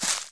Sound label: step earth